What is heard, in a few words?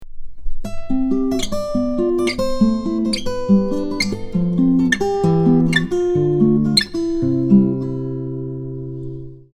Spread triad, another variation using a different picking pattern in the key of C, guitar; Spread triads example 6